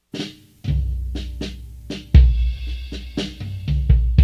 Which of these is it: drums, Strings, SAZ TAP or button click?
drums